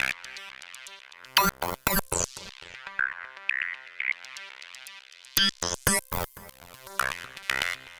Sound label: Synth